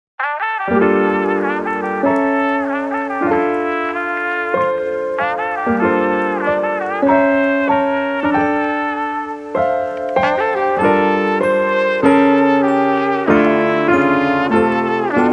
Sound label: TRUMPET